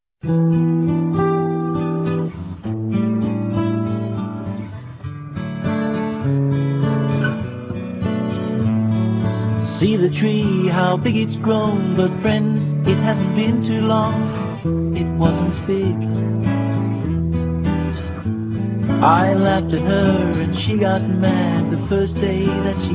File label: Honey Acoustic